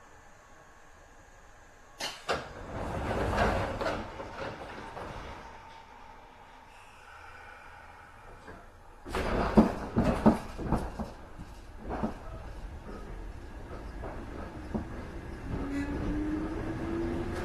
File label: sotetsu n7000, train